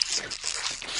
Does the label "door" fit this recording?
No